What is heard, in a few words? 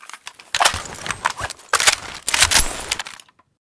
vintorez reload empty, weapons